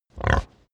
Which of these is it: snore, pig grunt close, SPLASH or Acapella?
pig grunt close